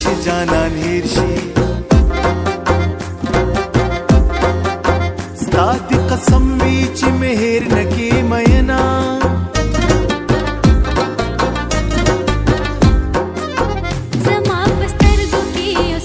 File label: pashto tapay